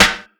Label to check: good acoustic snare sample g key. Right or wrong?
Right